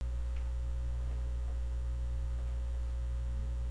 cow

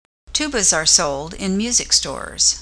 tuba

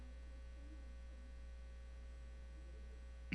explode

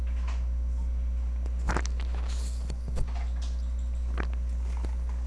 rumble

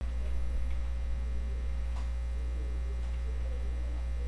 success

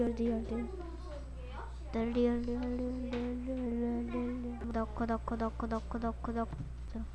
deer

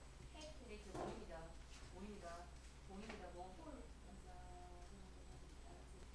gun

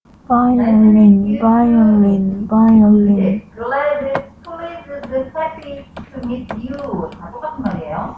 violin

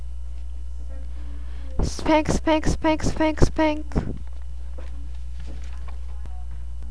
spank

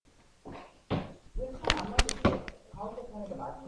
gun

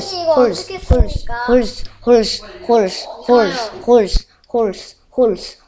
horse

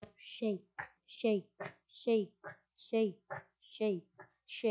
shake

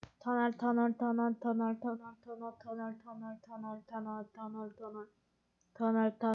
tunnel

